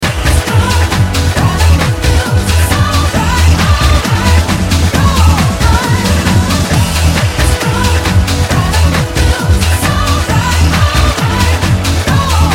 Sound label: Electro Optik Groove